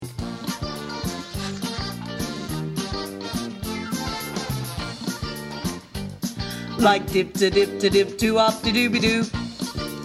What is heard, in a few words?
tenor